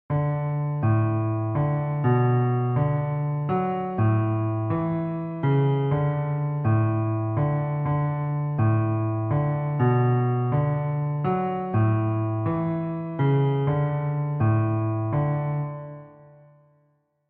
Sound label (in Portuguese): polifonia